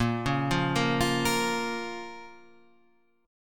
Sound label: A sharp Minor A sharp down Guitar Standard; arpeggiated; JGuitar